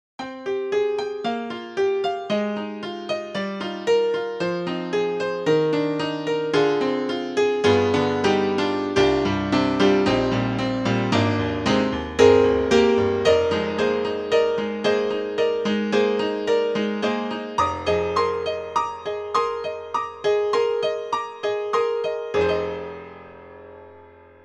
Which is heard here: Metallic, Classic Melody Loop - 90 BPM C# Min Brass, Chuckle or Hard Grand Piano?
Hard Grand Piano